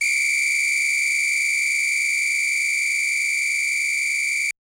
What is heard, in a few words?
Yamaha DJX 2B, Samba Whistle H